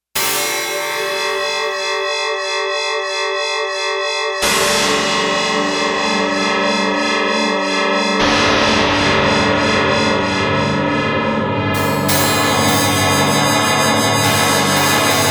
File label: StrangeChord; Digital Synthesizer